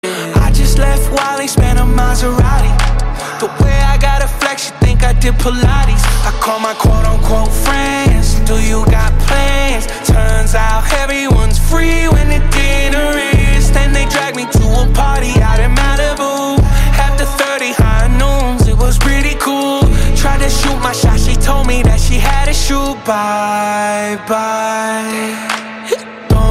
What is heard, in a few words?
Mourning